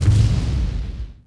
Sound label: fs bantha l, creatures; Star Wars Obi-Wan; XBOX